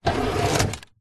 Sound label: household fridge drawer plastic open